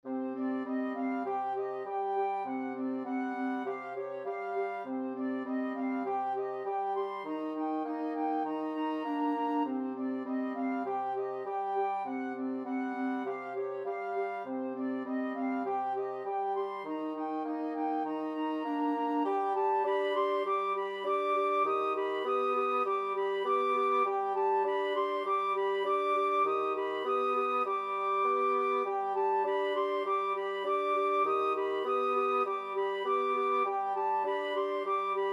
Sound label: flexible mixed ensemble 3 players